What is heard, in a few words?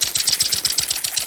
Chop Scissors Fly Loop; SFX Kit; Foley - Misc